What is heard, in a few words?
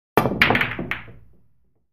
Billiards Cue Ball H